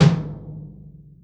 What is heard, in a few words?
Tom Live, Lounge Single Drum Hitz